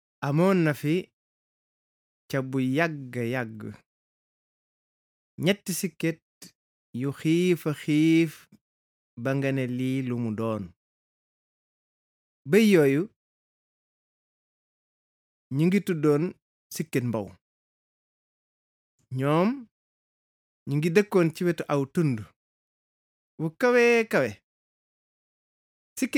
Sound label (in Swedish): bip